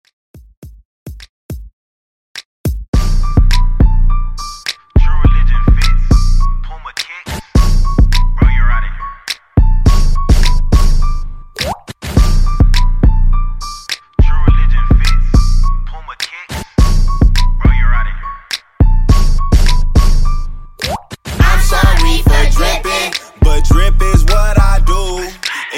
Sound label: Redrum